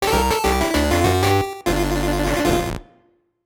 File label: Lose Life; donkey kong country 2000 gbc